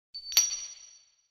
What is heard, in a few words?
UI comform sound